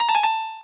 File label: cembalo, sound icons